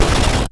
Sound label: Digital Rustle Glitch